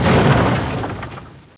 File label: EXPLO; Caesar III